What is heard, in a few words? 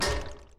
Mech bull Gears - Clank; Super Mombo Quest